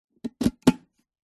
household swiming pool skimmer box plastic disc lid place on; zapsplat